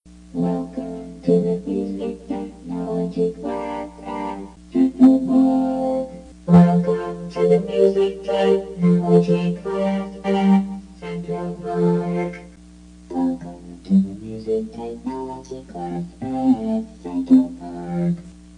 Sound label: synth